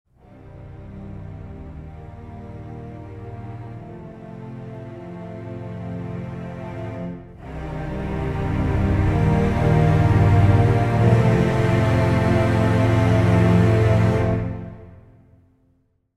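Pre Orch Ens 6 cello 4 double basses 8va Sustained; Audio Imperia Areia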